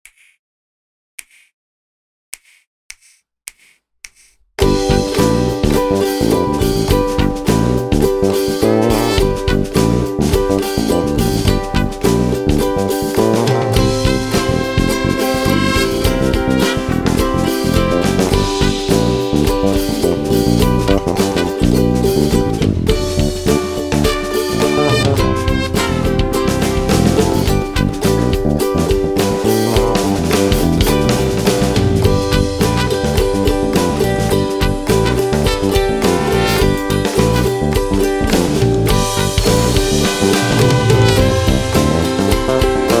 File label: 12 Bar Blues